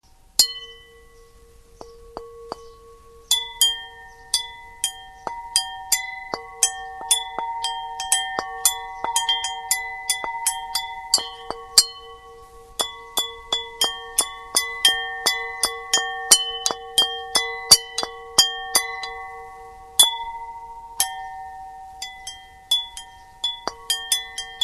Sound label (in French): cloche